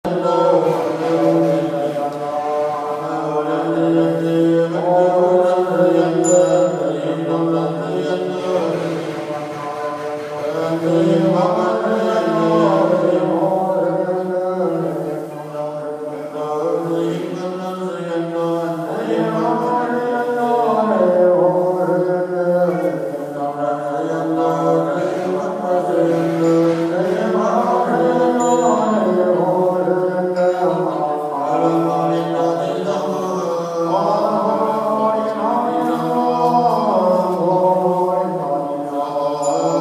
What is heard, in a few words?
monks chanting at the Po Lin monastery